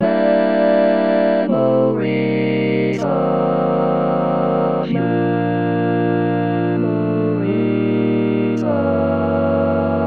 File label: Lead, barbershop tags